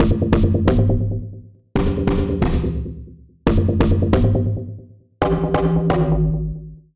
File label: Garage Crisp D Minor 138 bpm; MIDI Clips; Tonal; Percussion & FX; Skitter and Step; Ableton Factory Packs